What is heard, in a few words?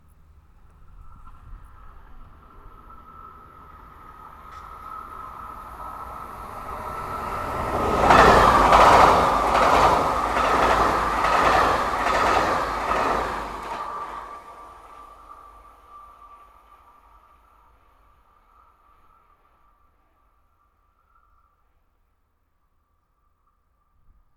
Passage TGV